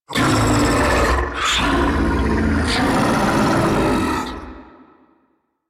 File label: Octopede Idle; Warframe